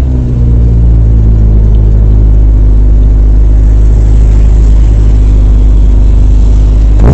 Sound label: spatial car buzz